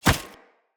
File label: Grunt Foley Chest Thump; Enemy; Sinecure; Melee Grunt